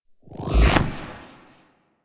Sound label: Mag Pull; Warframe